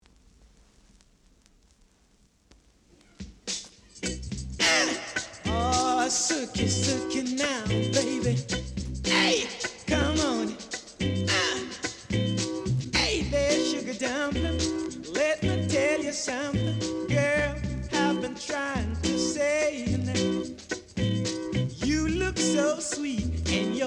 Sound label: groove